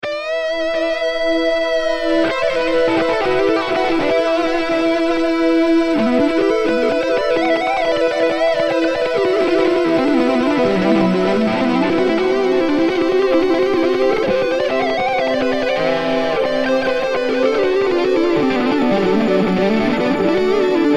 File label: Solo